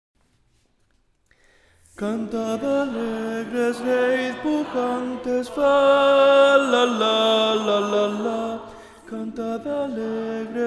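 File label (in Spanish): tenores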